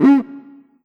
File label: Korg TR Rack Standard Kit Low Cuica